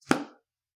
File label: book close